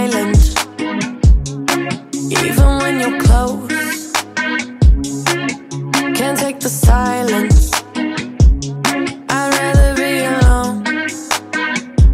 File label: ringtone english ringtones